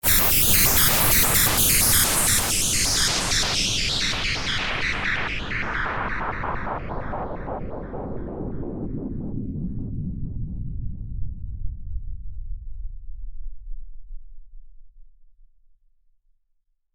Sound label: Pink Noise Effix Delayed; Techno